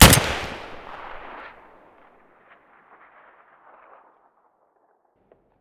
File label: svd muzzle stereo, weapons